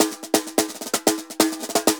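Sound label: TAMBOR SALSA, salsa drums and percussion; Best Service ProSamples vol.14; AKAI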